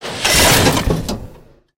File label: torpedo launch